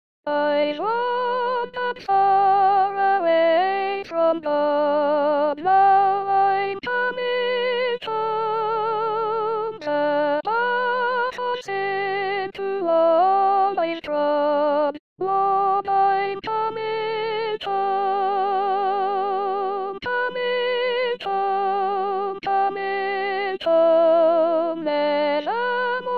Sopranes; Lord I m Coming Home

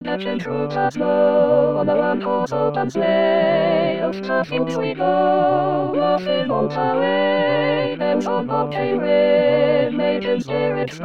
ensemble; Jingle Bells

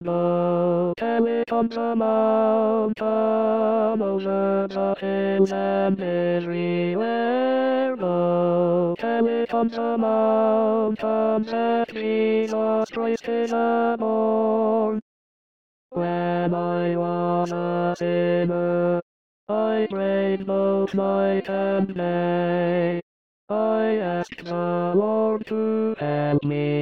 tenors

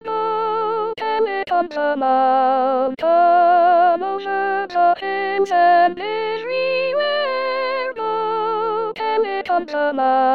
sopranes

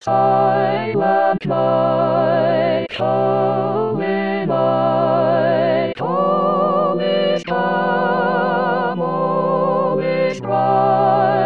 Silent Night ensemble

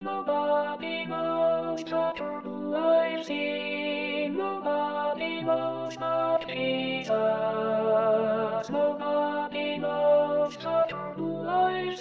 Altos Nobody Knows

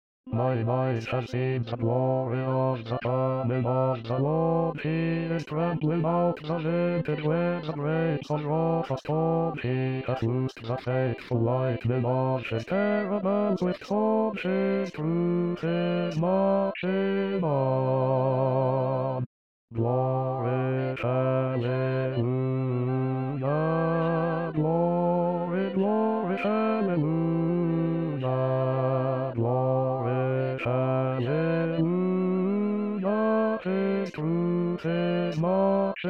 Basses - Battle hymn of the republic

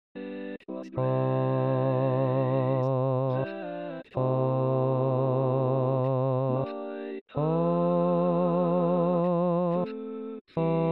Amazing Grace Basses

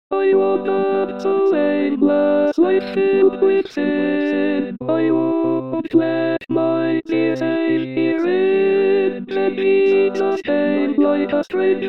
Altos

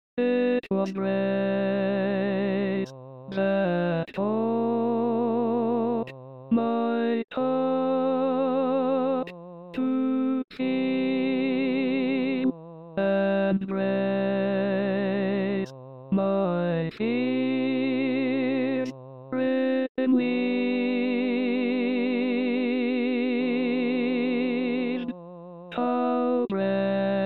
Amazing Grace Tenors

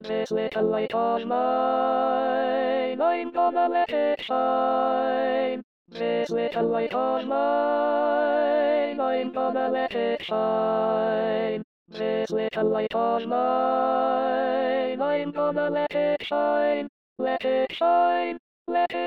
Altos; This little light of mine

